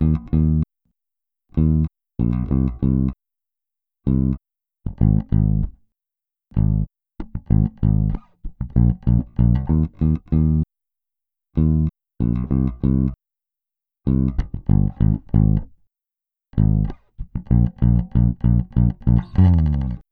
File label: clean bass; mcdsp